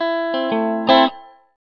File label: Pseudo Reggae, Guitars; ACID Music